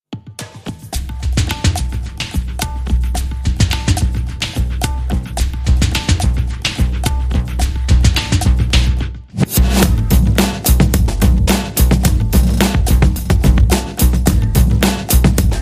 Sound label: Bombay Bass, Short Rhythm